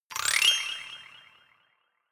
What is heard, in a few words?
notification glockenspiel sparkle slide up